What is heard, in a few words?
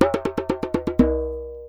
Djembe Fill; musicradar